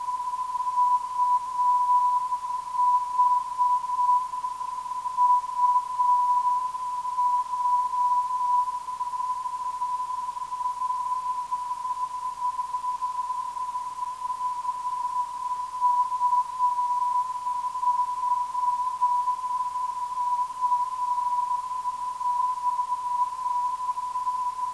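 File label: UH 455kHz, non-directional beacon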